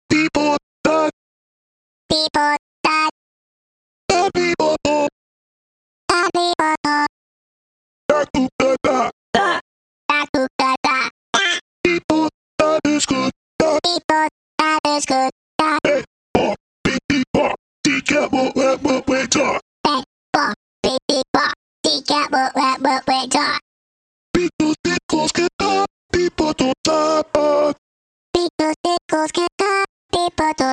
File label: voices